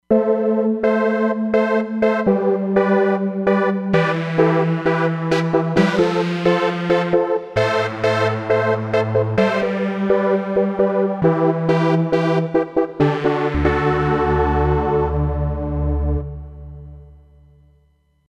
Roland Fantom XR; Digitaless

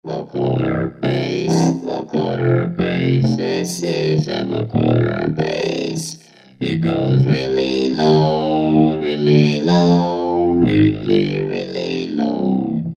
Korg microKORG Bass Vocoder; Factory Voices

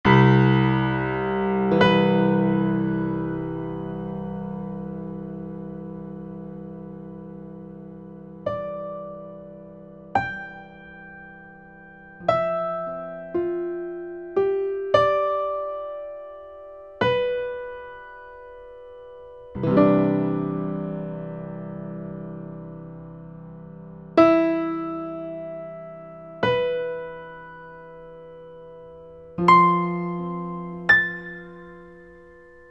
Acoustic Grand; IK Multimedia; SampleTank Free

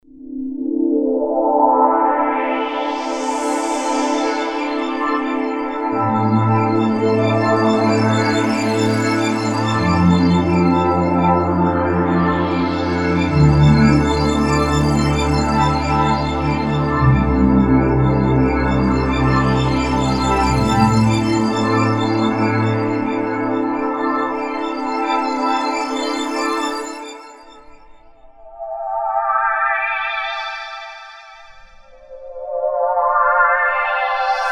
Flange Wrap, synth fx

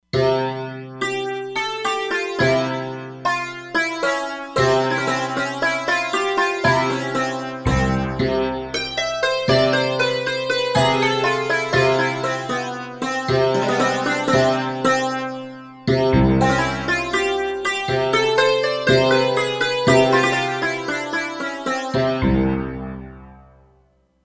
Santur, E-mu Proteus-3; Factory patches

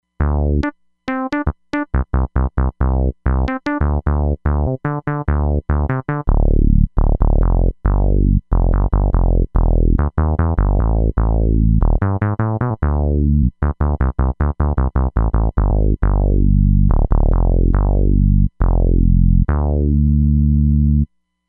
FS Rubber Bs, Roland Fantom XR

